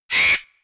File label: Vulture; Animals; XBOX; BassProShopsTrophyHunter2007